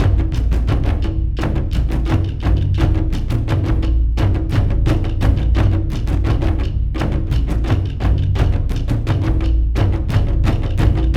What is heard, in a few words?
Drums; For Honor